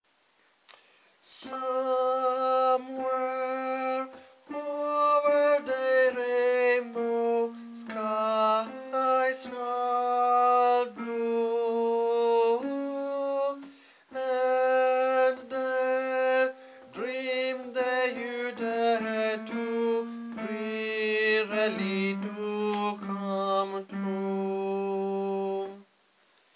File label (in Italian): tenori; Coro Isola del Giglio